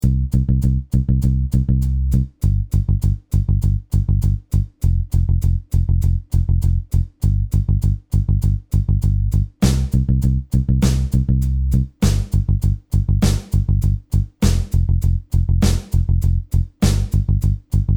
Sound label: Happy Beats